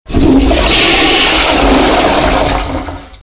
Flush

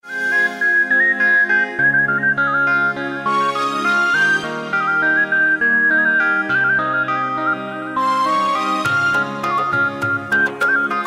Arabic Ring

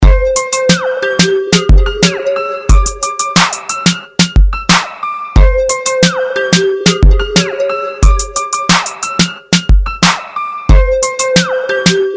Hip Hop Drums Keys

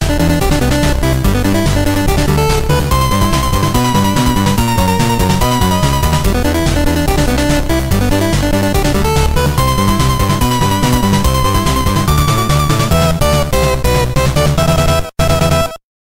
8bit

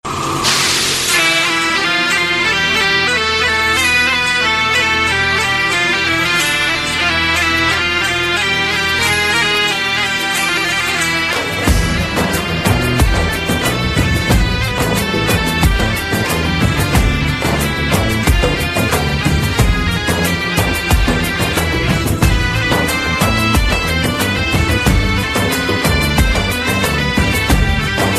Hiss - Nagin Tone

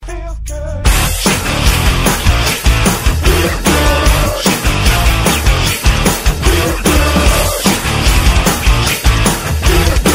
Feel Good metal

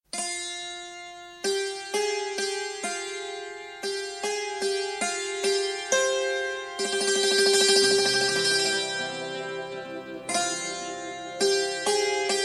Very Romantic Guitar

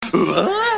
grunt; Tim Allen

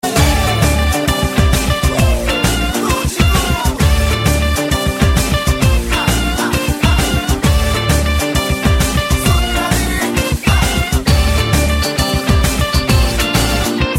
Guitar Tone

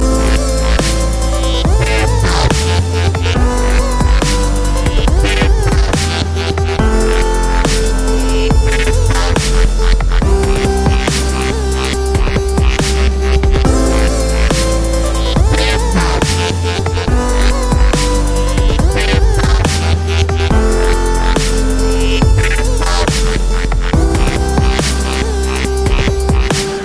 dubstep mix

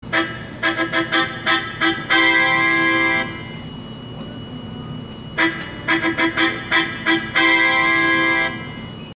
bread oven; Wawa

